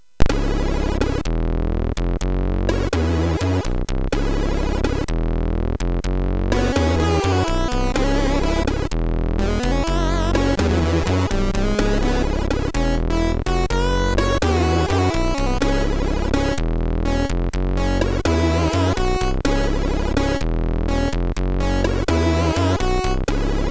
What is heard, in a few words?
Blues, MOS6581; MOS6581R3